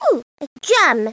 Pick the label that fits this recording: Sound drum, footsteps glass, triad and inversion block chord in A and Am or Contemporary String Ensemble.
Sound drum